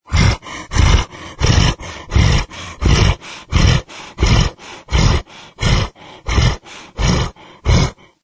Monster Panting Breathing, Monster panting: Fantasy horror creature or very large dog pant and; Breathing short breaths, panting and grunting